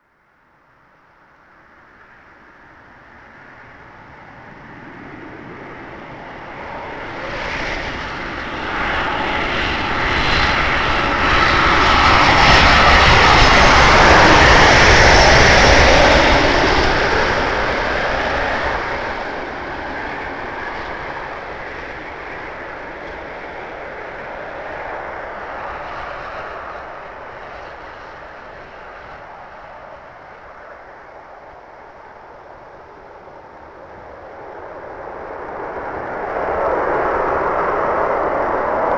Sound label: Airplane